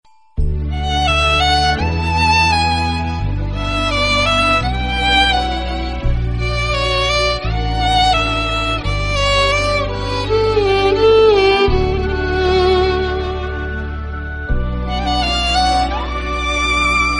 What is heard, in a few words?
violin